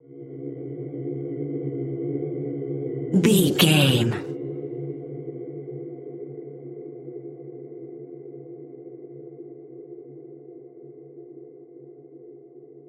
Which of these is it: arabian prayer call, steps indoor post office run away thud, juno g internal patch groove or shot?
arabian prayer call